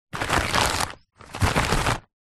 foley paper mail bills pile short movement hands; zapsplat

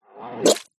human male vomit sick into bowl

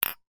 household lightbulbs x2 hit together lightly

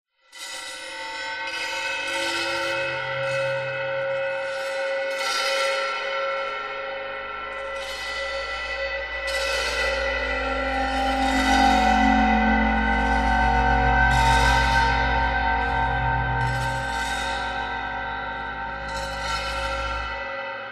drone metallic reversed hits reverb; sound design

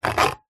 wood thin scratch with sharp metal fork; foley; zapsplat

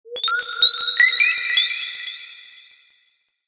mysterious drips; sound design; zapsplat

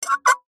foley metal sharp edge scrape on metal pan squeak

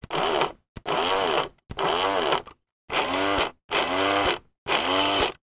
toy helicopter rescue winch motor short bursts; sound effects one

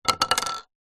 wood stick hollow bamboo drop wood surface; foley; zapsplat

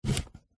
cardboard shoebox empty pick up; zapsplat

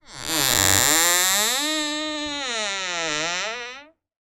household hatch door hinge creak

